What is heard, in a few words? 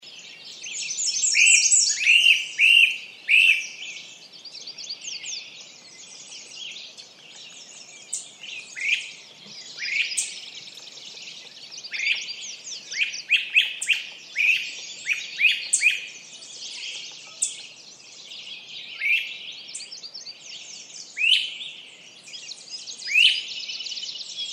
minnesota lakeside